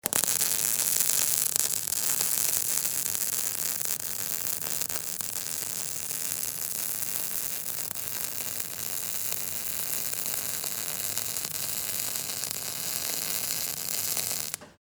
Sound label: welding electrical